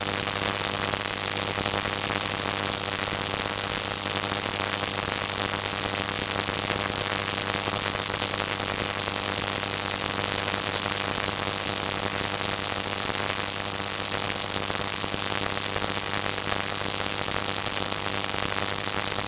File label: glitched tones AM Radio Interference